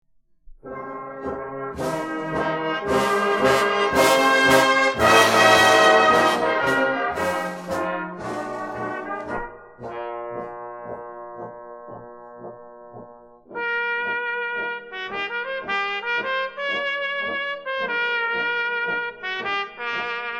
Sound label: vinyl proc